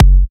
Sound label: Bassdrums; Vengeance Samples